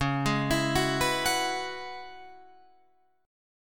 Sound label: Db Minor 11th Db Guitar Open F; arpeggiated